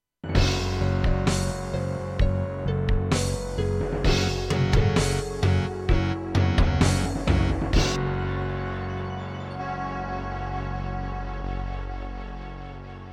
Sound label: Ensoniq VIVO 90DB-A; Doom (Sigil); Introduction